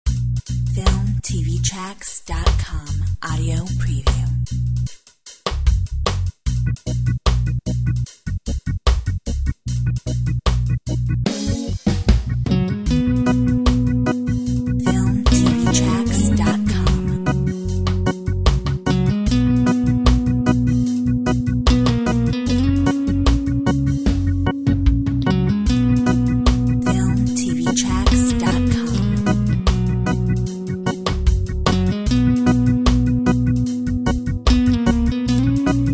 Reggae

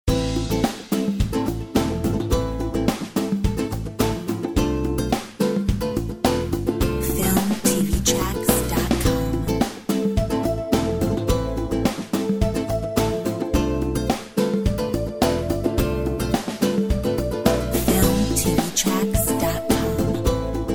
Flamenco

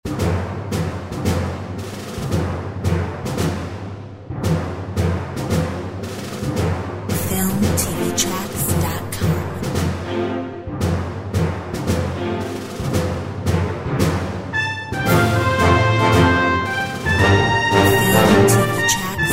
Fanfaresque

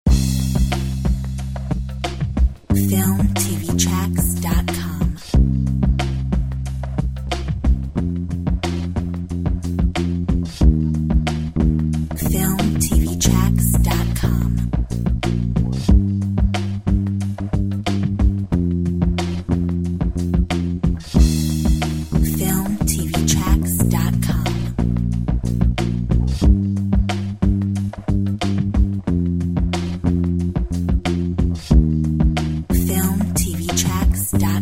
Drum bass; Filmtv-tracks

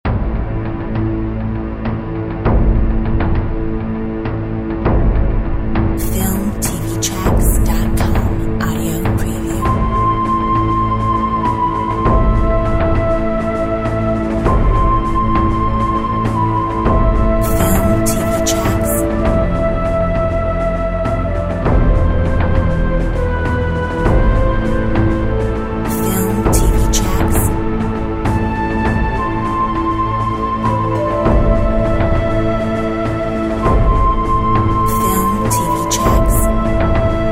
wild disco

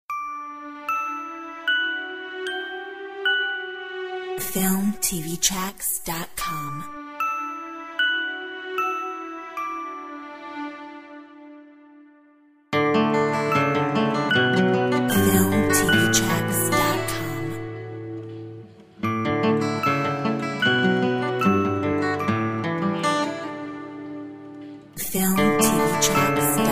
Sweet Lullaby

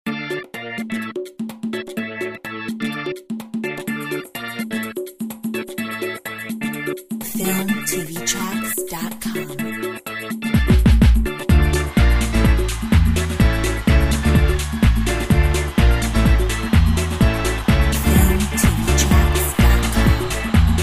salsa

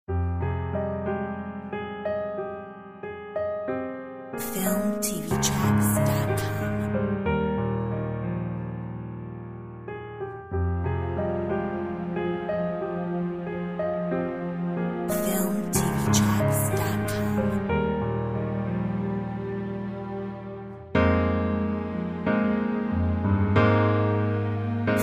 Reflective cello; Filmtv-tracks

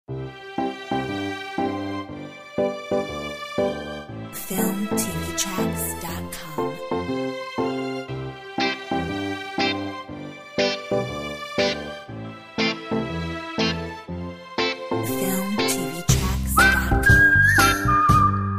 Sunny Heart whistle; whistling and happy music track

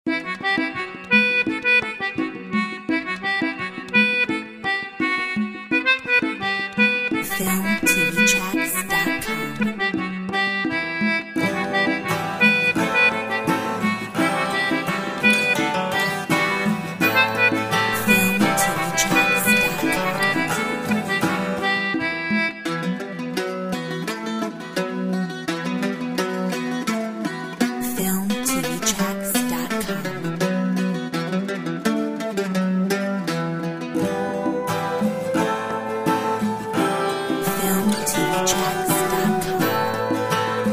Irish Gigue

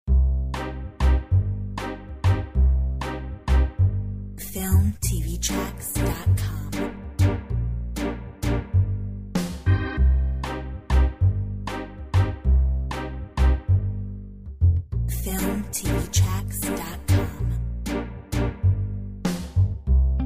Spicatto mix no drums

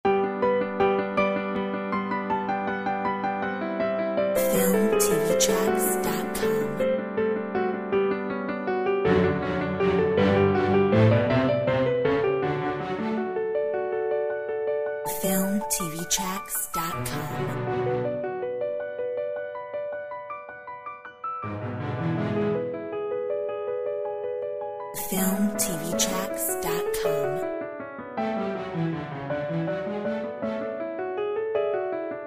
Beethovens piano

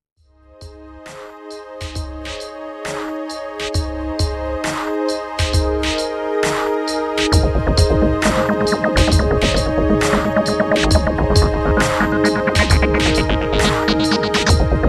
High tech

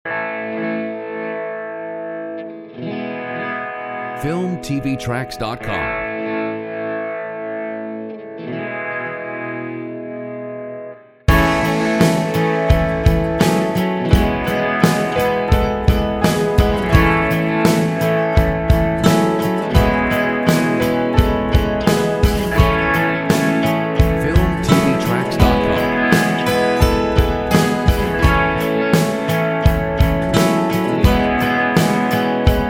Uplifting Theme

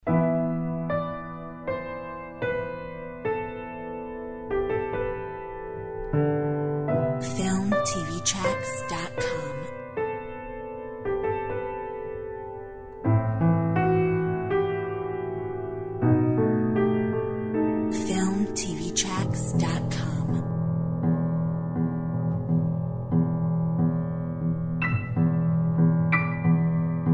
Metal

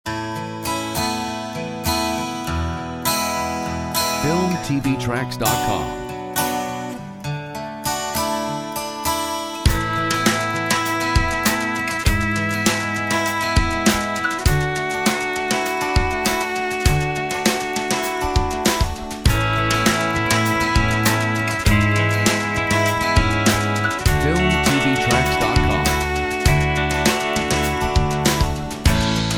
Country life

